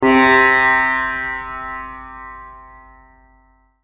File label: Sitar Note